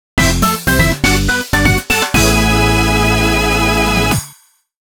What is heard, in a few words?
2nd-4th Place Fanfare; Mario Kart 7